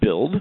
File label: build; micropolis